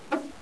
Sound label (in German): Tür; Loewe1